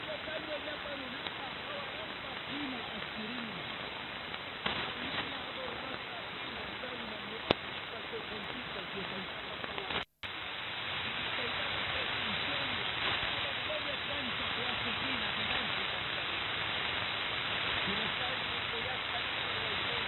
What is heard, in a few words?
4955KHz AMS; Radio Cultural Amauta; Reuter Pocket